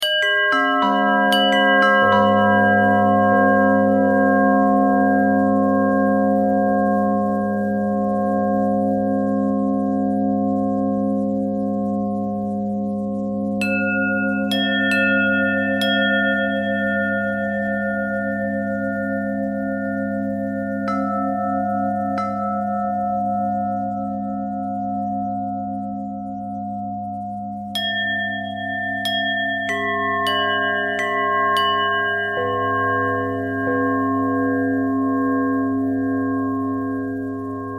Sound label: bell bell thread pentatonic, bells